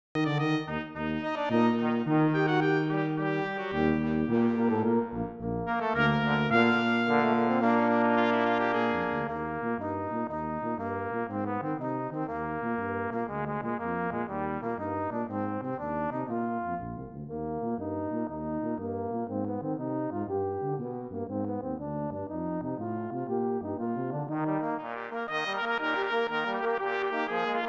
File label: midi audio; Sailing Overture